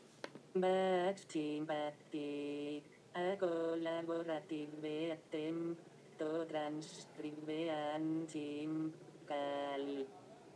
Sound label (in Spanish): Chimpance